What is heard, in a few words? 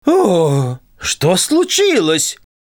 Drums; Twitch Drums